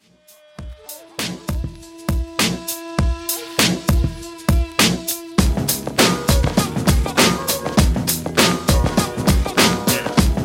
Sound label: Classic Redrum 100Bpm; djyan